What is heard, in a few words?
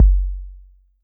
808 K; Roland MV8800